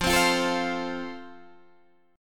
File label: F Major Guitar Half Step Down